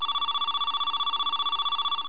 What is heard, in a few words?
ANS RING